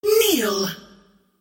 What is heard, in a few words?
attack whip; queenofpain